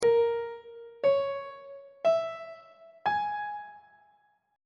d flat dorian 7th chord 6 is b flat half diminished 7th on treble clef